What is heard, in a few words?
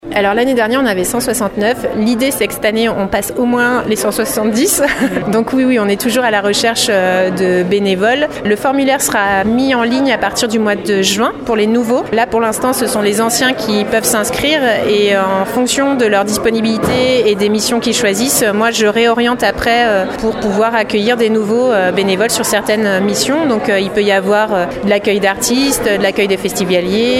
brass